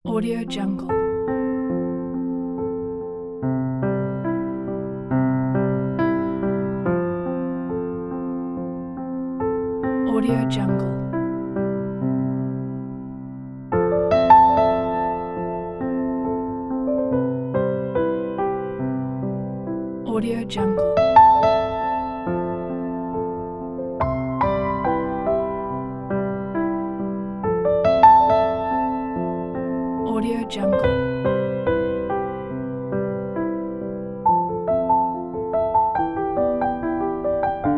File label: lonely girl